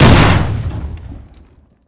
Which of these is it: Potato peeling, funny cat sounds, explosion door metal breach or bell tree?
explosion door metal breach